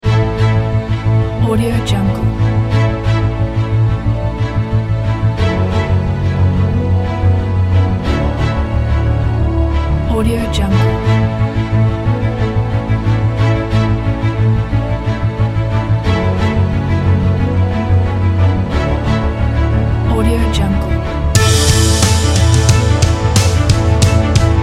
sports inspiration

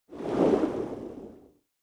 fish movement midrange fuzzy burst bubbles; prosoundfx

